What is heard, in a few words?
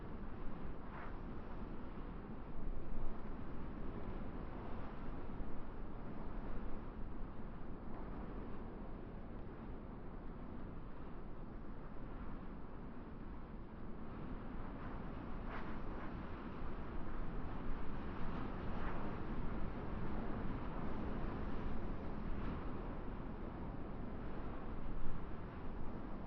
desert; Golden Crayon Sounds